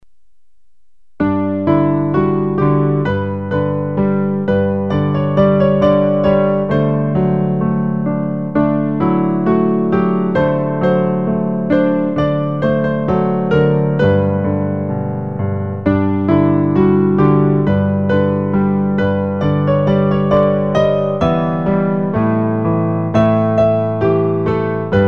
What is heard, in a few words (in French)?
piano; recueil Louez Dieu